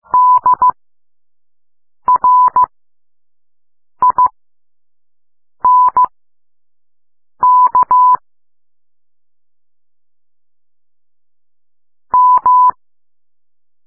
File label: morse code